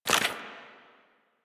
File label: Generic Footstep; Warframe; Dagath